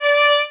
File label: violin